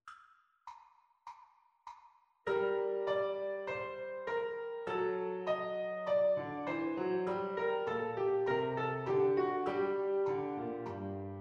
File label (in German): Zweistimmiges Diktat g-Moll; Unterricht